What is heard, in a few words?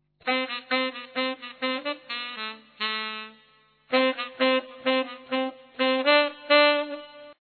saxophone